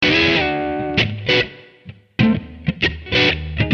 B4 130 rhythm

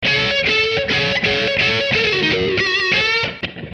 110-130 lead

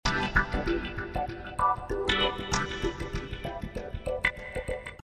Swinglefunk C

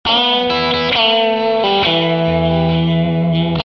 Em 135 country lead

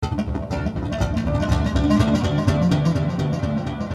Bass Amb Slap 122

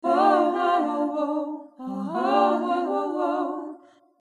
115 Ballad 2 Harmony

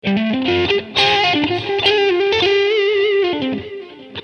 D 100-120 solo

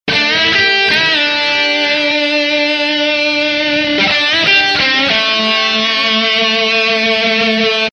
Am lead 115-125

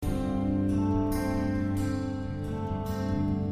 Picking D

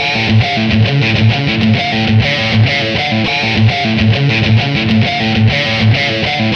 rhythm, 100

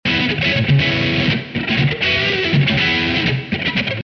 Db 120 rhythm

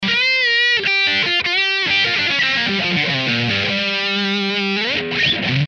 150 Lead Speed Rock G

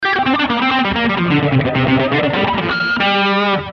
130 Verse Alt Rocker G

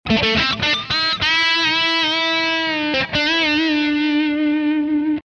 Am 110 solo

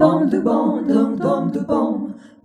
Jazzy Harmony

